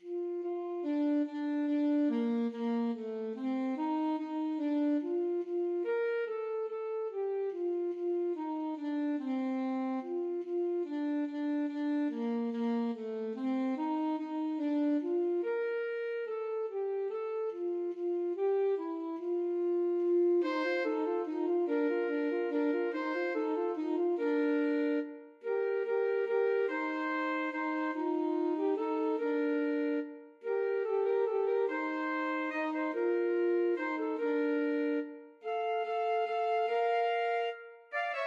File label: alto saxophone duet